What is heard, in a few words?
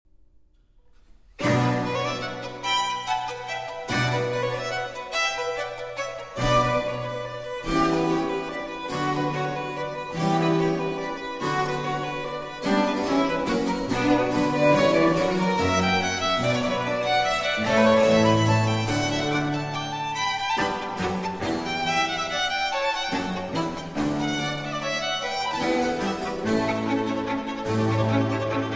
Sonata For 2 Violins & Continuo in B Minor, Presto